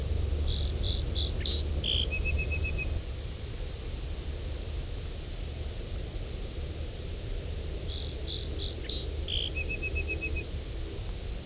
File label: Song Sparrow; ibm glen